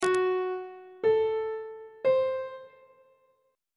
c lydian chord 4 is f sharp diminished on treble clef